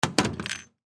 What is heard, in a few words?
Jigsaw Set Down Metal; Machines; INDUSTRY; Blastwave